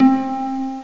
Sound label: harp; OctaMED SoundStudio V1; mirage